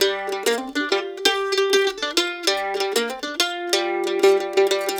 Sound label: Blues Guitar Samples, lick